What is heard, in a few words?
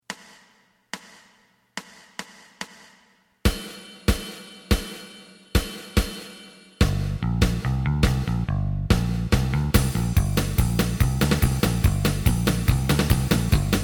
lead